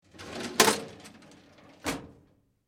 Metal File Cabinet, drawers

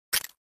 Button Stomping On Br, buttons

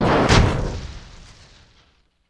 Metallic Screech Impact

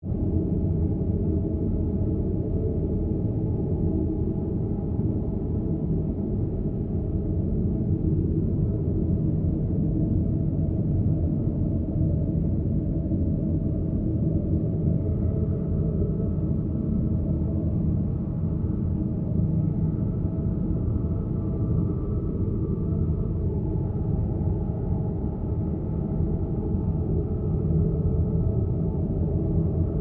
Ambiance Tonal Spac, drones & tones

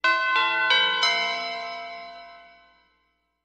Music Logo; Tube Bell Chime For Announcements.

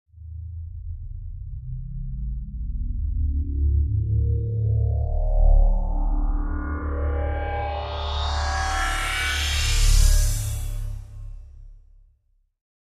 Stab Fluctuating, stab

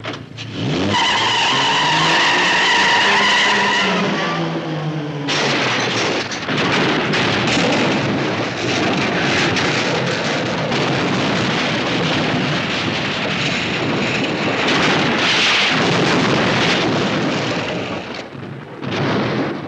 Car Peel Out Crash

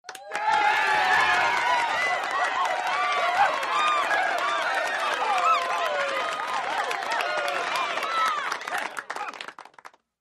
Game Show Wild Crowd

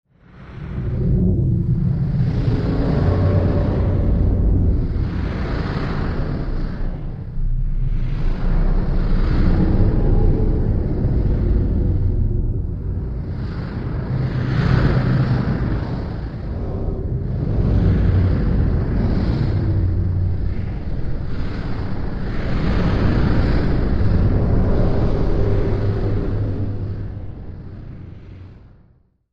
Metal Large Eerie

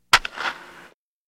Baseball Bat Wood Hi; baseball fx & games

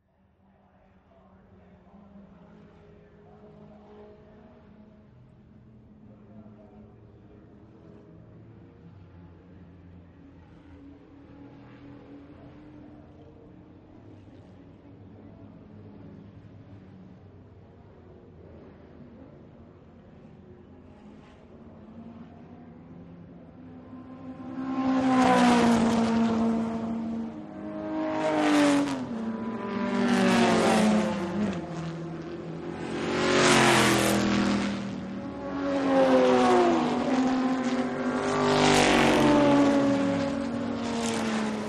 Vintage Car Race Bys

